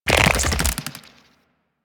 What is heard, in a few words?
Infested Big Ashen Melee Start; Warframe